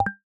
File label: Screen Capture; system